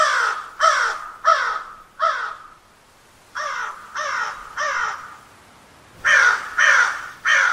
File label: crows sound effect